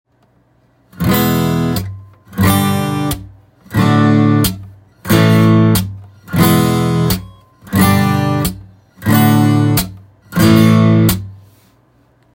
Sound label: string slap example, percussive sounds on the guitar